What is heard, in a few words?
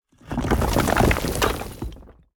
roll rubble; smite; god geb skin7